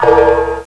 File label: alarm, cartoon sfx